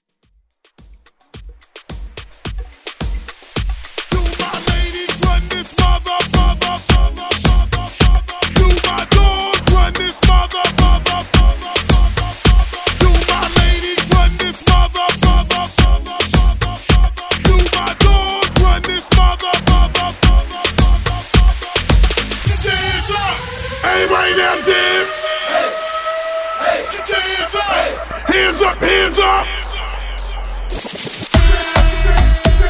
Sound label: Moombah Mixshow